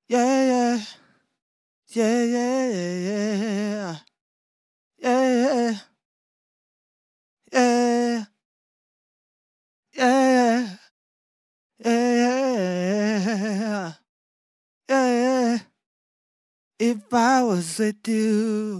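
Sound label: Pop RnB 97 F minor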